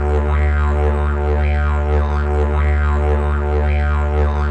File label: DIGERIDOO; Best Service; World Colours